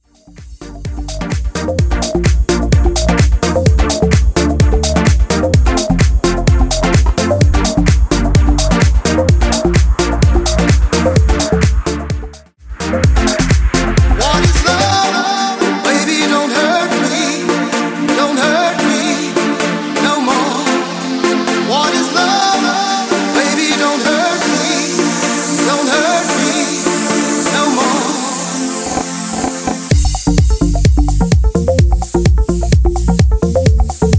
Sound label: Deep House 128bpm